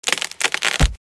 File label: ELECTRIC